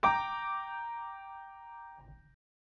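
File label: G minor higher, chords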